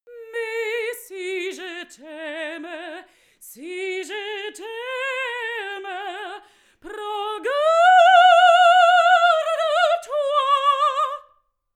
female sing opera

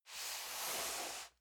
cardboard box medium slide soft; objekte

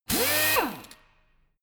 impactor high; maschinen; bohrer

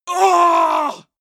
male combat hit hard; soundpool

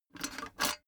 cash box metal lock; maschinen; soundpool

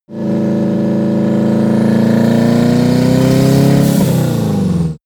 car vw t1 accelerate short

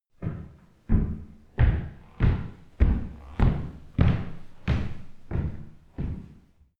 step shoe on wood

